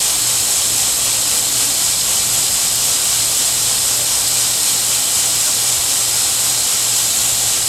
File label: Rainbird Spewing Out Water, No Clicking, Loop